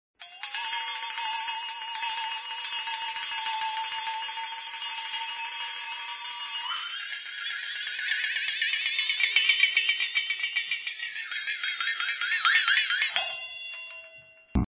Spooky, Haloween; Rosegarden

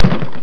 BOARD, Klappen en Vallen; sound effect

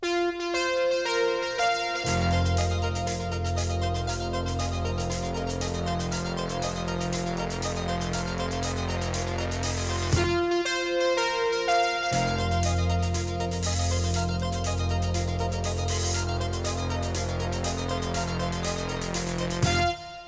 topformat maxi jingle single

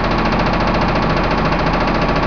surface metal grid loop

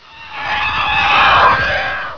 flyby

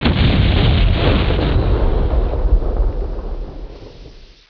BANG, Gunshots; sound effect

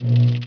soft switch

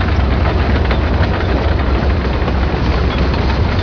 sandcrawler loop

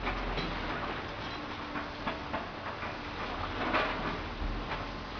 junkyard loop

